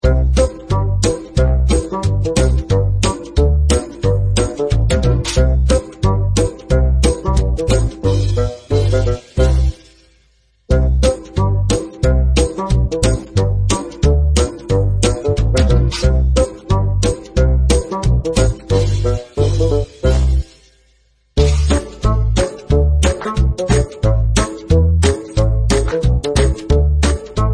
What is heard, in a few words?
ui bgm; 弹球音效; tanqiu